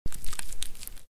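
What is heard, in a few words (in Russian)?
странный щёлкающий эффект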